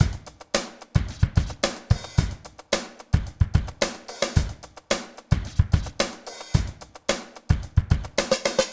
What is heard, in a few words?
110 TEKKN, LOOPS; Best Service; Hyper Dance